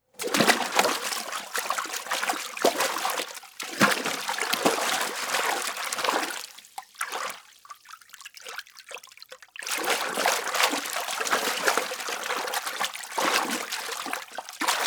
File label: Water Splash Bath; Fairlight Sound Lib